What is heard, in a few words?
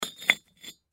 Brick Stack Single, Brick Stack Single Set Down; Rocks; Foley; Blastwave